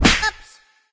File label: hero death; subway